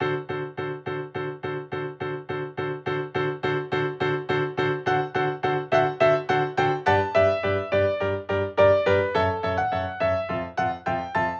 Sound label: Piano Arrange; Yukiiro Sign